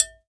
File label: Perc Triangle; Bruno Bosselli